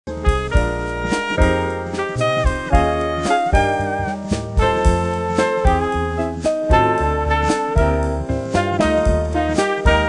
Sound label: jazz featuring a prominent trumpet solo